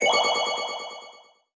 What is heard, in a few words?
Reward Tokens Sparkles; Subway Surfers City Sound Effects; Android